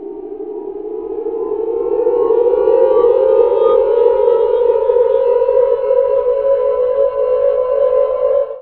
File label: teleport mechanism windup; Half Life Model Pack; Half Life 2; ambient levels